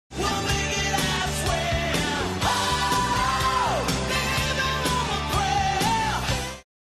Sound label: singing